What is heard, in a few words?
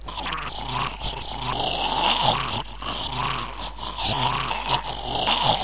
fast zombie alert; escape series